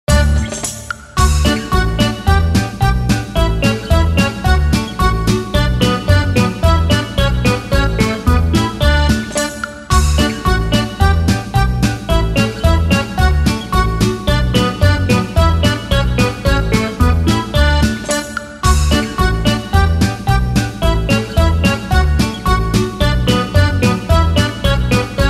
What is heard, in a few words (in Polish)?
Nagranie skocznej melodii